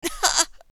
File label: Pain; Assemblee SFX